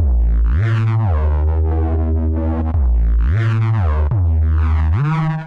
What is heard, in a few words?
Bass G Minor 175 bpm; MIDI Clips; Ableton; Factory Packs; Skitter and Step; Jump